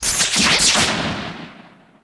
Gun, Phaser, Single Build Up Blast; gun and weapon sounds; captain audio; fully loaded

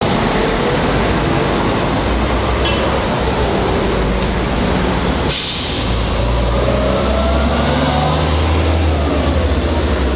bus terminal stop go, street traffic; best service Blue Box

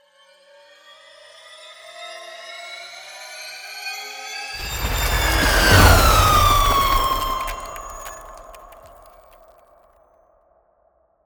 Ice Spell Whoosh, Cold Whooshes; Arctic Aura

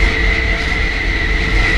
ELEVATOR Movement Loop Noisy Squeel Deep; mono; Universal Sound FX; ELEVATORS & LIFTS

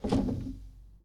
Foosball Center Spin

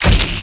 Melee Magical Medium; Kilta

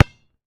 Street Ball Bounce Asphalt; SFX Kit

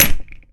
Grenade Bounce; SFX Kit; Weapons

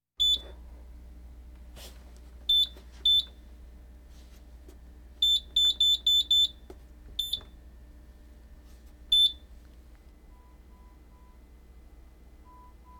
Hospital effects

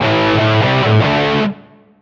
Pickup Guitar Riff; SFX Kit; Pickups

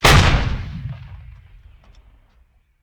Artillery Cannon Artillery; Sound Ideas; Guns

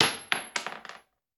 Bullet Shell; Sound Ideas; Guns

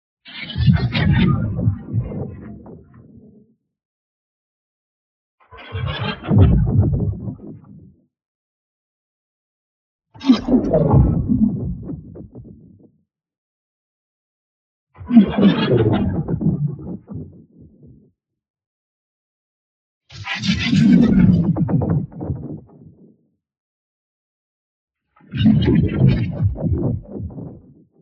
ABILITY RELEASE Grain Drain; boom library; Matter

